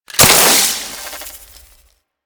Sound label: Branding Bolt Destruction; Warframe; Tutorial